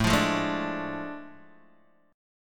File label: A Major 7th Suspended 4th Sharp 5th, Guitar, DADGAD